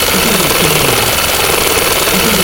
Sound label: gun auto barrel spin fast loop